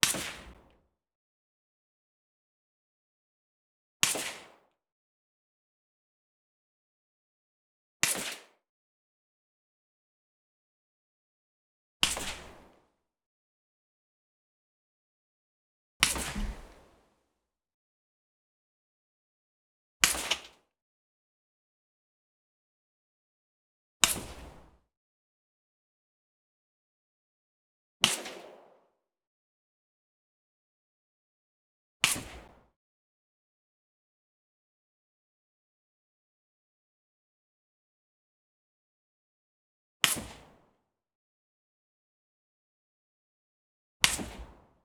De Lisle Carbine .45ACP suppressed 55m in front off axis clean Single shots